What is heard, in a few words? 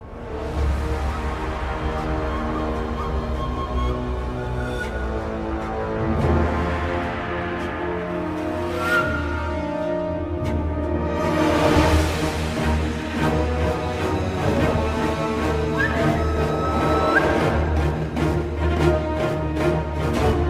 Forest Battle